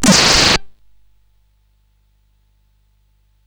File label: Shuffle, CSG8580R5